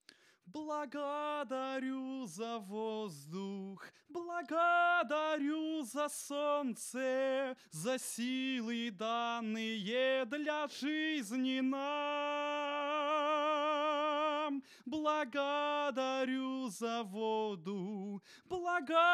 vocal

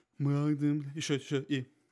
bass

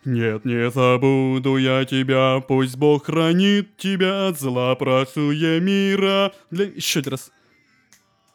bass

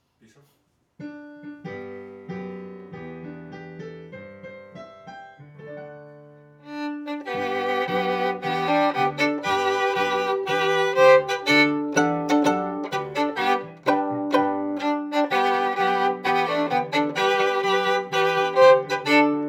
Viola C

